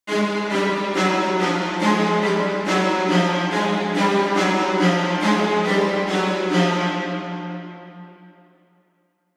Intense Strings Mid, horror strings

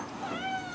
Cat Meows - Food Time; Micro Pack

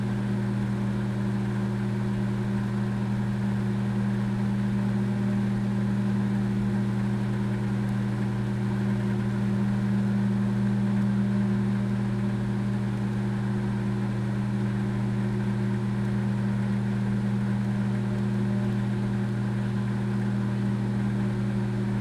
Microwave; Fans and Drones

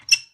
key open

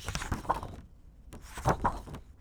Floppy Paper; Micro Pack; Paper Cutter

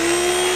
machine

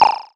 Retro Blop

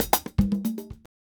Bongo item place; FREE SFX PACK VOL 2

